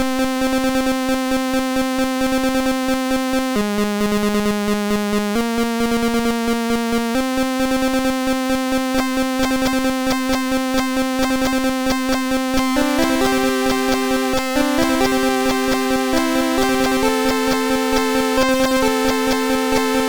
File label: Terrychin, MOS6581R3